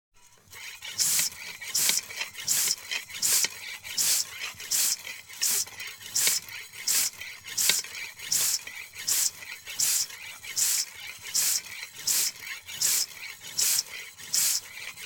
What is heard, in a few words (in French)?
Pompe à vélo